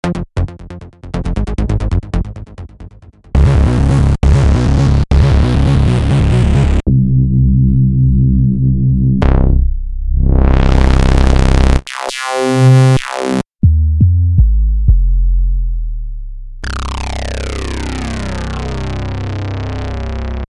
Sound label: Fat basses